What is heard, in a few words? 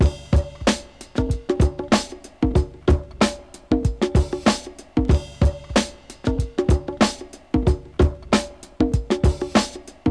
drums